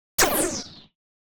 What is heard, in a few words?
Space Ship By - Buzzing - Turn Of The Engine - Thin; Hollywood Edge; sound designer's toolkit v2